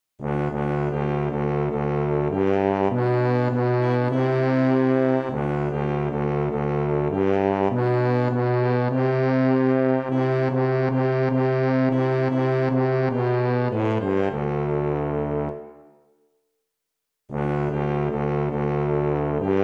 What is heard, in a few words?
tuba